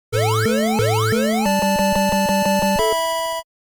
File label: Start Signal